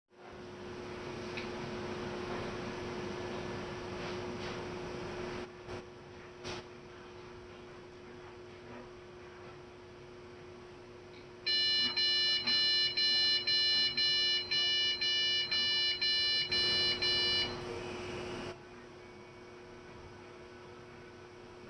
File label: Beep Code